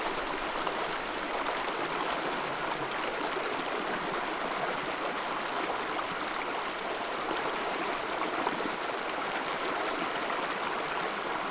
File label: river